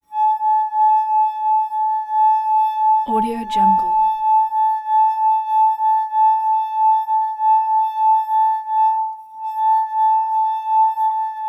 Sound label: wine glass ringing wet finger circling rim